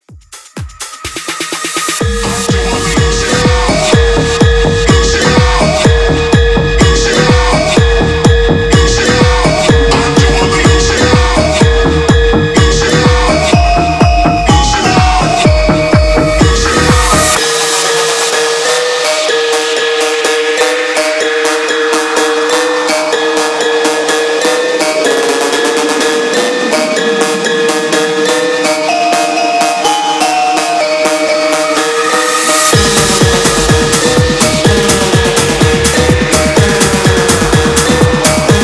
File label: Tech House 125 BPM